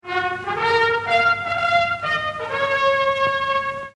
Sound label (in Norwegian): fanfare